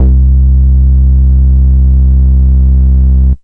bounce, synth

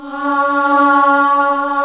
boy choir C sharp 3; Boys Choir; VOCAL

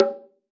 8 bongos bongo; ETHNIC and WORLD PERCUSSION; Eight Bongos

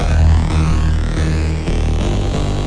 090 bigsynth drawdown; SYNTH AND ELECTRONIC LOOPS

